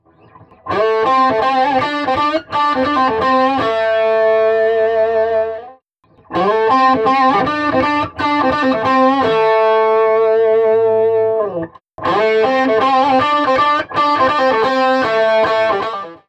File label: Marshall Plexi; Testing Joe B settings